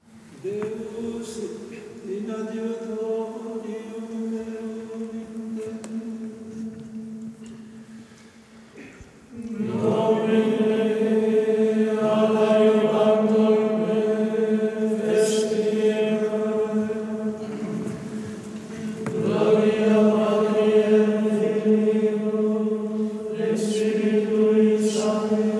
Binaural Catholic Gregorian Chant Mass Liturgy

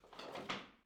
Opening Drawer

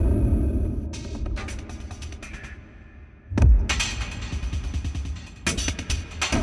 Marcato Drums

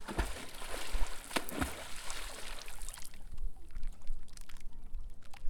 Splash

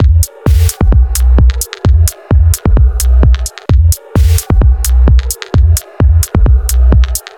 Octo House Loop

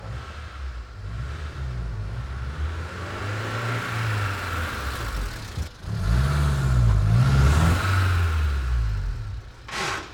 Auto Ext Pull Up Pull Away Handbrake; car ext

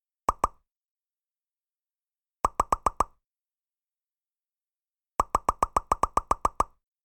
Likes Thumbs Up

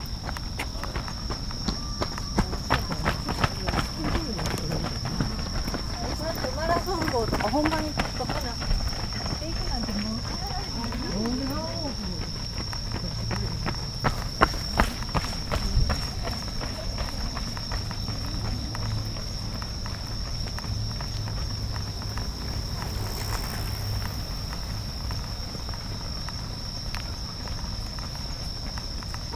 Joggers

